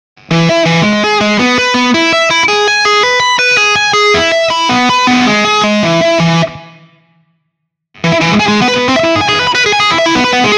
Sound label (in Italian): Arpeggio maggiore